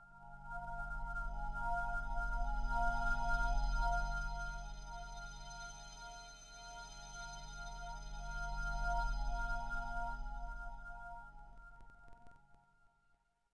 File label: Icy Pad; Ambient; Sid Meier's Civilization 4 Complete; Beyond the Sword